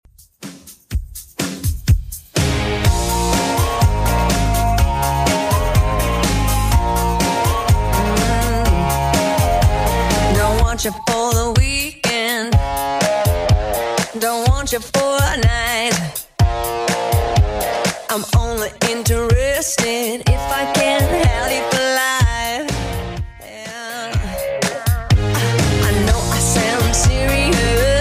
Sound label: 2ks Country Redrum 124bpm